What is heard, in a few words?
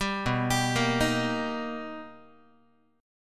B Augmented Major 7th B down Guitar; B+M7; arpeggiated; 10,7,10,8,7,x; 4A,4E,5A,5D,5G#,6C#; JGuitar